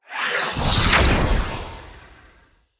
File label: Staff Cast Long; Weapons; Enemy; Staves; Mnd Wazard Staff